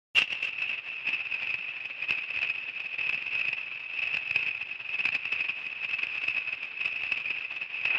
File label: boiling